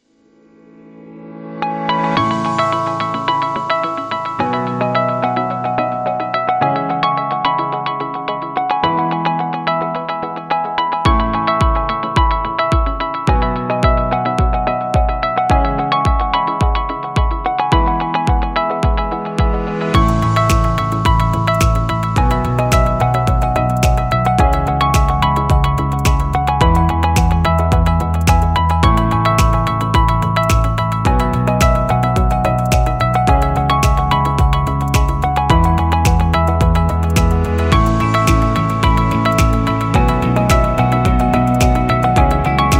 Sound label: Positive Inspiring; waiting music